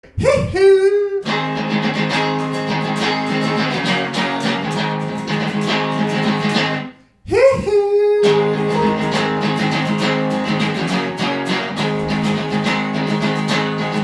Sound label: acoustic